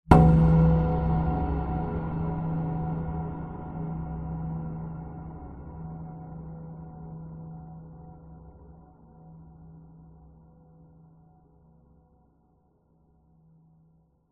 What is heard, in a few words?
sound design cinematic hit dark reverberant shock terror suspense